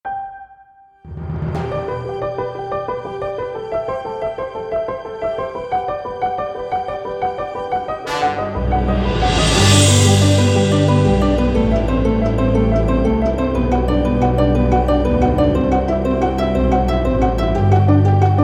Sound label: Orchestral BGM; Jigsaw Trading Ad